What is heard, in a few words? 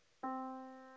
Yamaha Disk, piano sound